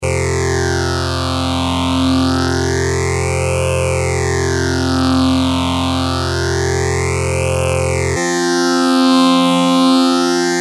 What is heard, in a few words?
prodigy phase 100